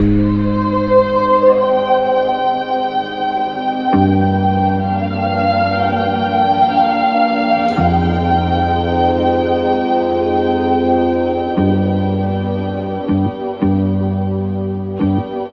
strings wistfulness; Loops; Sad

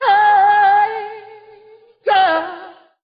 hey yeah SUNG

